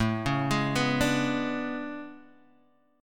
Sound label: A Major 11th, down Guitar Open D